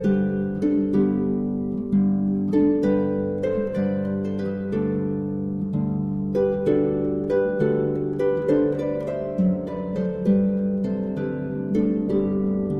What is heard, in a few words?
Traditional Neapolitan Carol Short Fantasy for harp